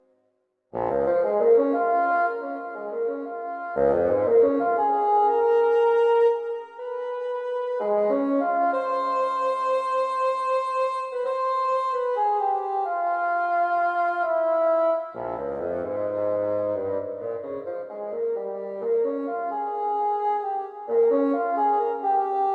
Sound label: bassoon